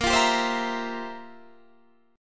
F Major 7th Suspended 2nd Suspended 4th, Bb, guitar